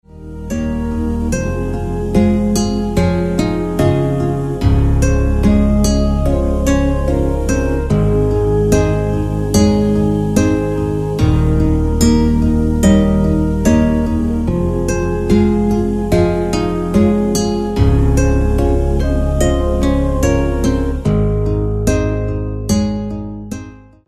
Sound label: hawaiian guitar